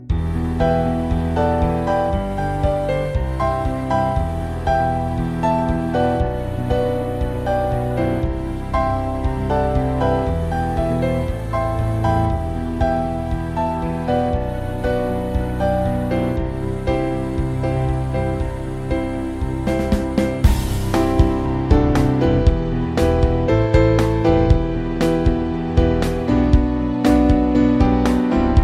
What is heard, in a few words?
instrumental ringtone